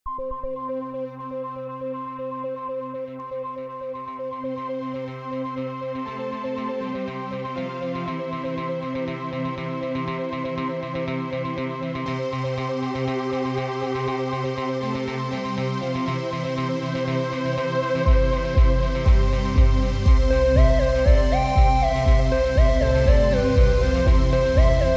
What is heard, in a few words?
Electro